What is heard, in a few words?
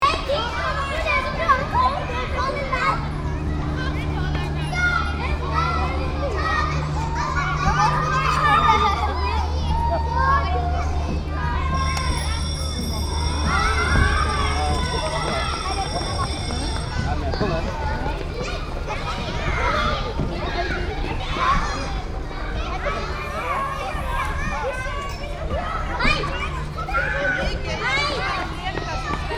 School outside children playing and teachers